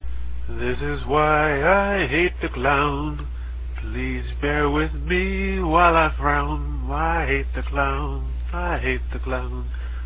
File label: clownsong